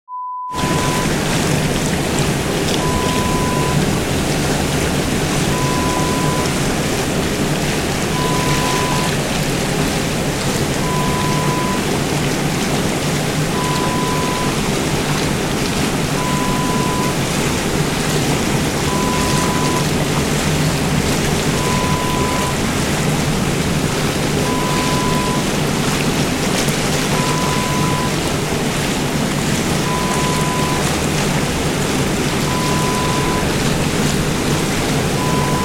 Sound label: Jacuzzi sound